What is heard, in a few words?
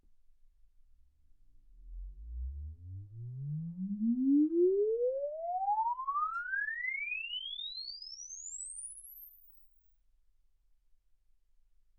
exp swept sinus 10Hz 24kHz